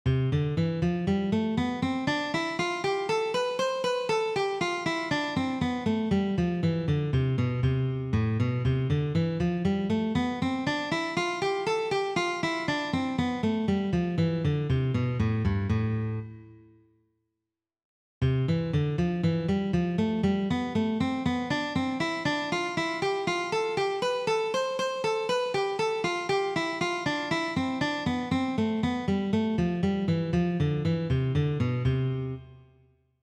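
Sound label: C Major A minor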